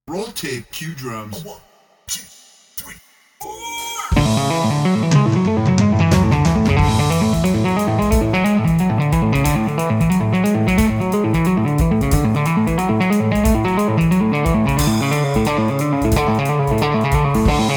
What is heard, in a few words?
Ditty